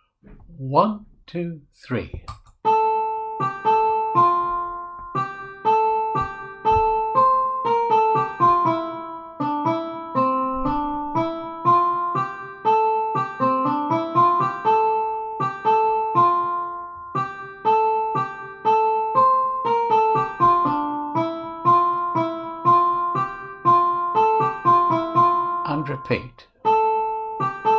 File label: Bushman's Waltz - melody practice file